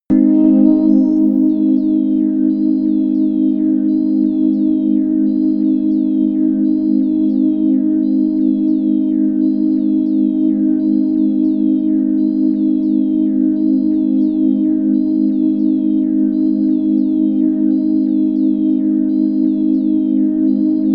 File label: soft organ chrips; flaechen